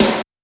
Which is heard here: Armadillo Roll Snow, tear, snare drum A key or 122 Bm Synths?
snare drum A key